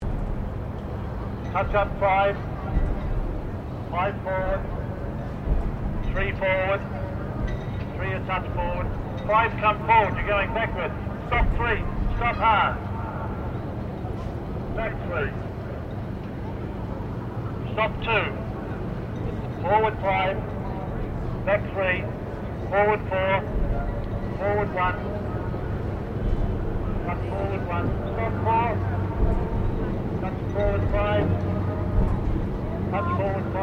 Boats Dragon Boat Race Forming Up; Dragon boat racing; Vehicles

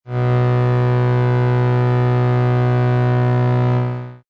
Energy Transfer, Science Fiction Sound Effects; Energy being transfered from one place to another, or force field, electrostatic, scifi static; Lynne Music

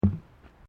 Footsteps Boots Bridge Wooden Step; Footstep Foley; Footsteps (Sound Effects:Human)

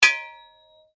Blade Heavy Moving Impact Ring, Sword

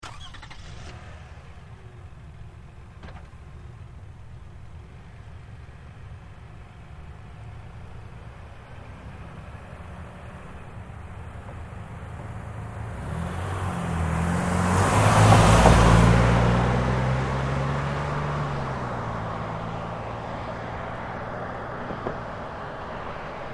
Car Highlander Start Away Med-Fast; 2005 Toyota Highlander V6; Cars (Sound Effects:Vehicles); Big Room Sound

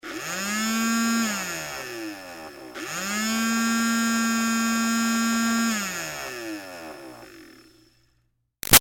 Toy Bubble Machine Med Revs; Miscellaneous Toy; Toys; Big Room Sound

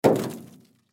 Snowball Throw Car Hood; Snowball Throw Car Hood Impact Light; Ice (Sound Effects:Weather); Blastwave

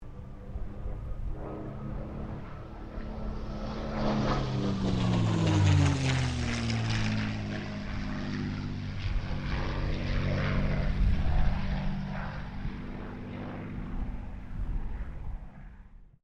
AIRCRAFT CA-18 MUSTANG PASS

